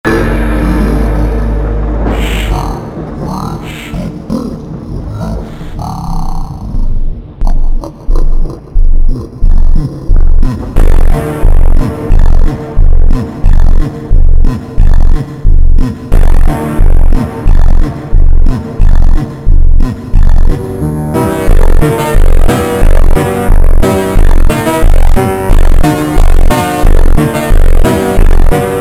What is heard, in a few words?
Funk slowed